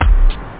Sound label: wierd drum, drumkit; OctaMED SoundStudio V1